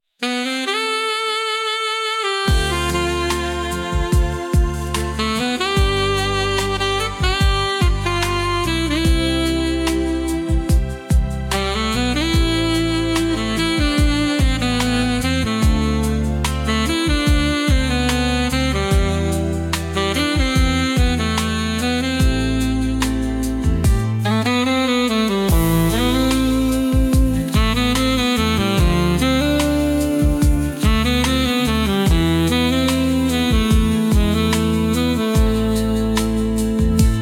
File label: saxophone dreams